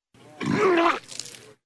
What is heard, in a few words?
puking